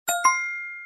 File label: correct